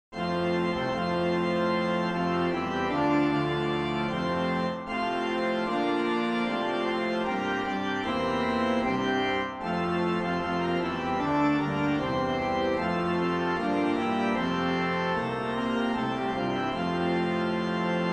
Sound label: Organ